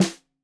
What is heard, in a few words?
Snare 65 Reg Stereo, Deeply Sampled Drums